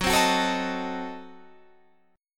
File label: F sharp Diminished 7th F sharp Guitar Standard; strummed; 14,15,16,14,16,17